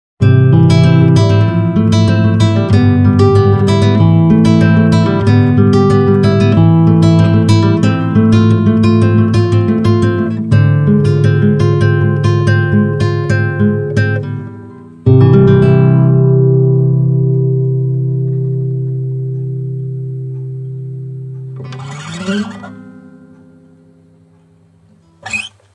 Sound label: Guitar